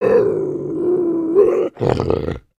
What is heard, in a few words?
Skullkin Idle VO; creatures organic